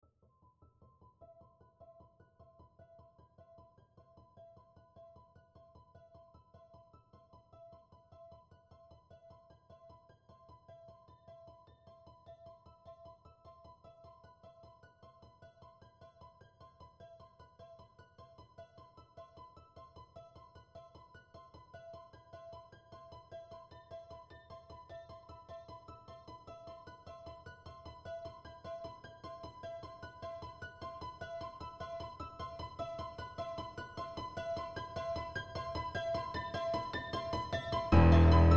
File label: Background Plague